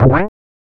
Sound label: Bounce Back; FREE SFX PACK VOL 3